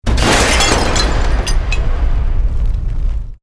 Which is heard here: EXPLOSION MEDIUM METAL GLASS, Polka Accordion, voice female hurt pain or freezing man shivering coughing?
EXPLOSION MEDIUM METAL GLASS